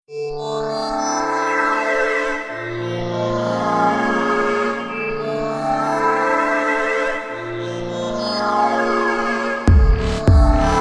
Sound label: encounter, off melody